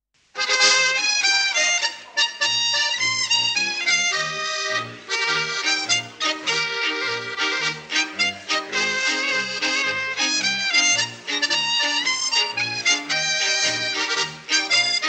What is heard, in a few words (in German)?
Jingle Bells Tanzversion